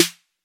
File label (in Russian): malij baraban odinochnij gluhoj bistrij